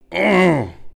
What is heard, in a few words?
priest argh